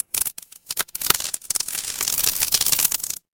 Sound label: magic scifi portal